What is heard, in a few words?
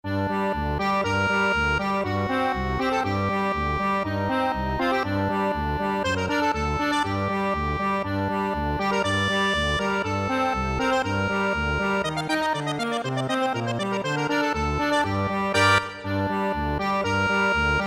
hungarian dance, accordion; brahms